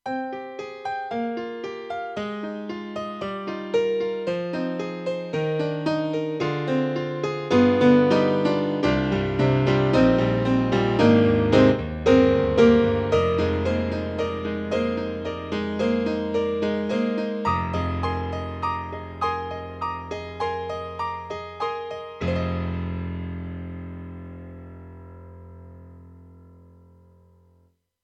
MILD GRAND; AKAI SG01p

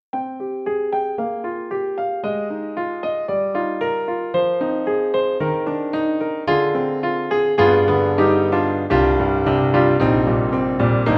Piano; KORG; KRONOS 2

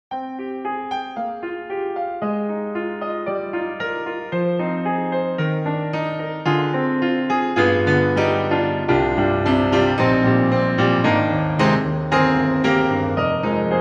Bechstein Master DYN; EastWest; Quantum Leap Pianos

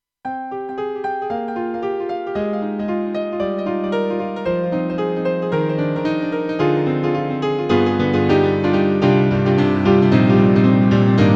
Roland JV-2080 Echo Piano

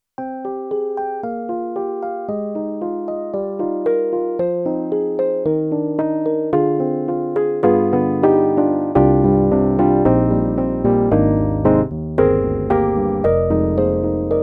SD-80 Soft Rhodes; EDIROL

